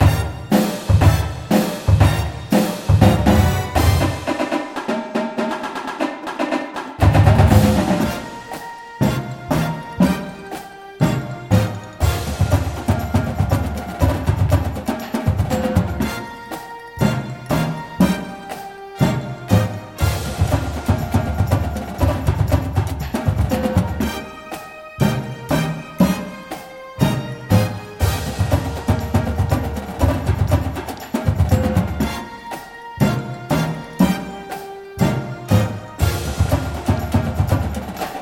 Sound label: full drumline w music